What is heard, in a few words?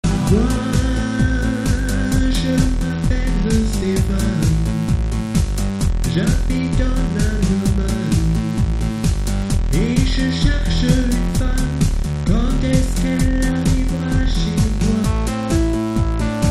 Electronica